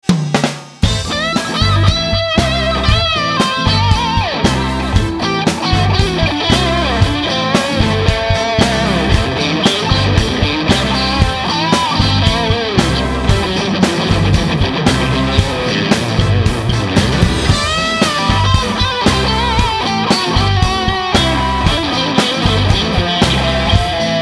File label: stratocaster